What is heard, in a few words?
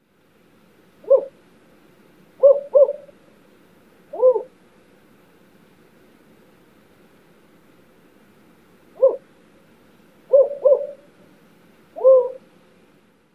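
song, Spotted Owl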